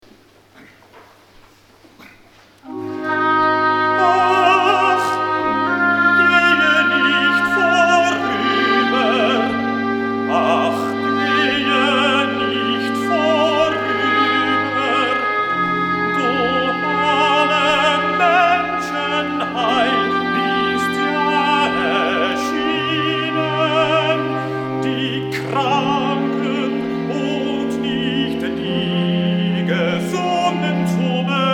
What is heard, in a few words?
Accompagnato T; BWV023 Du wahrer Gott und Davids Sohn